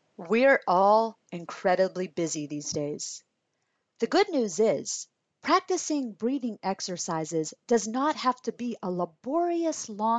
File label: Breathing